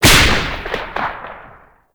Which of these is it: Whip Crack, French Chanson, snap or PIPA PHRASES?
Whip Crack